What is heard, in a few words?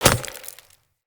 Throwing Knives Impact Vegetation; Weapon Impacts; Environment